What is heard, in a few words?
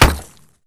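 Bullet Light Ballistic Concrete impact; Titanfall 2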